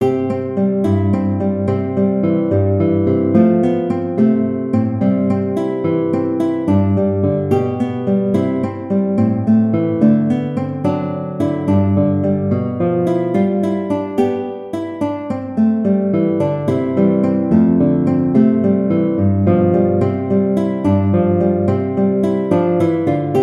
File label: guitar